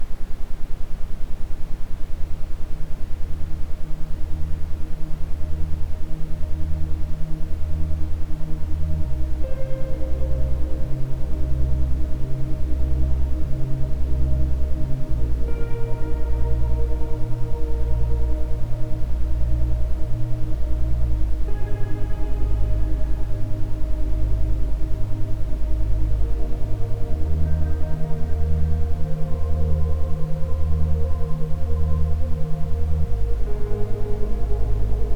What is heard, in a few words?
isochronic, Ambient Sound; Gesundheit Jetlag; isochrone toene